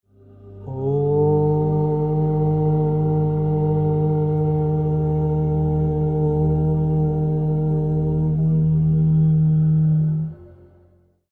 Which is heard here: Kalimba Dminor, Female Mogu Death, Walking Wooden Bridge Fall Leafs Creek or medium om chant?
medium om chant